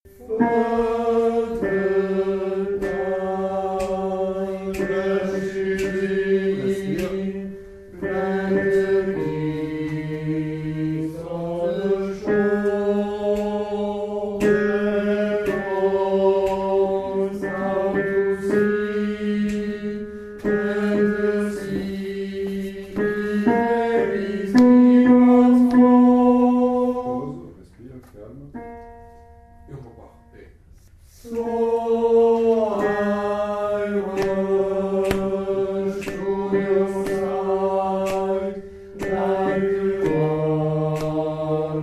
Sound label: Tenors